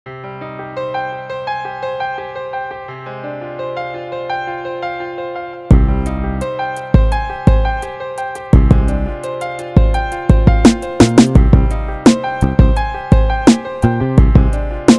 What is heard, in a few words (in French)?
Hip-Hop beat nostalgique; RnB Hip-Hop